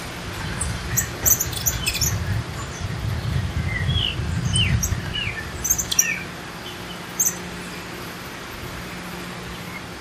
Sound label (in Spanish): Picaflor Tijereta, Eupetomena macroura, Swallow-tailed Hummingbird; cantos